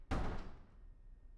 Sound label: door slam; IEEE AASP